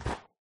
snow, step; Minecraft

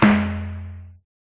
Game Start; Minecraft

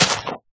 grass, dig; Minecraft

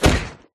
knockback, player attack; Minecraft

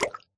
bubble, bubble column; Minecraft; block

